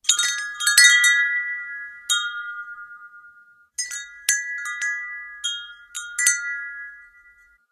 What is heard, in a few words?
wind chimes